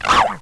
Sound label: pit drone communicate; svencoop